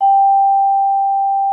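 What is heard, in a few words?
vibraphone G5; Xsample VOL-4; VIBES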